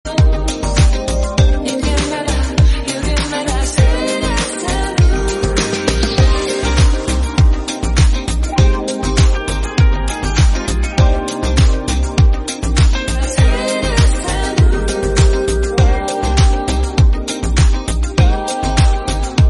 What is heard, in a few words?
sexy house music; fashion tv